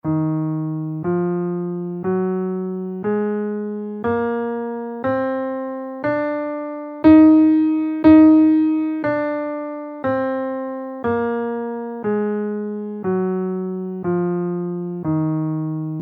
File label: melodic minor scale in Ebm